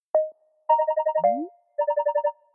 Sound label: Flutter; Teams